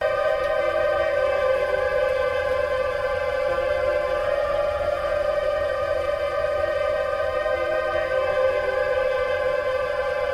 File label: Electronic Recordings From Maui Jungle; Editions Mego